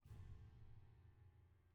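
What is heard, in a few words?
pedal Down, Grand Piano; bitKlavier Grand; Piano Bar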